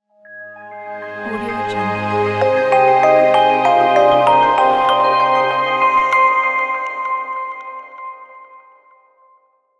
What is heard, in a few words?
urban logo